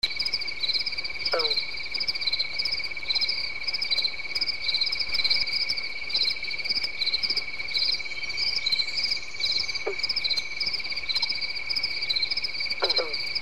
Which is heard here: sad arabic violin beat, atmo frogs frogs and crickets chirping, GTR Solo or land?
atmo frogs frogs and crickets chirping